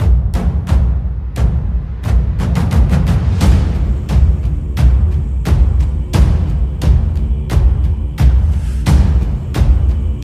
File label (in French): tambour de guerre